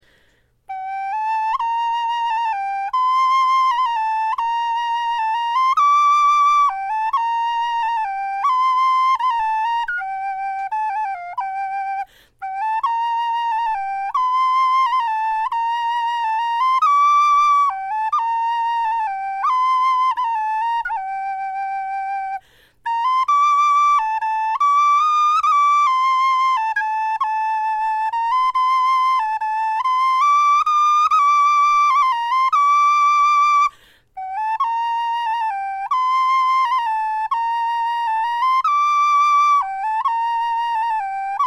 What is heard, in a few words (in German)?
melodie, knochenfloeten